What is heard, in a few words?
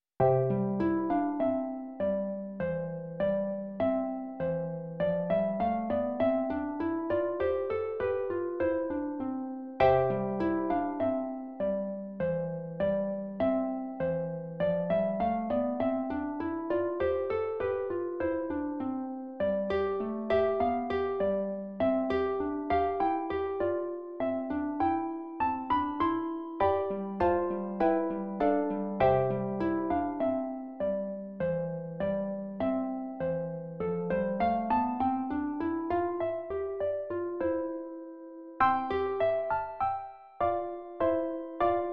Carols for Solo Harp